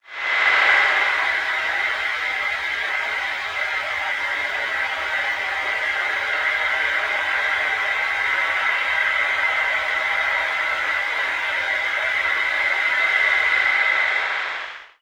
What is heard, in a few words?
SHORTWAVE MELT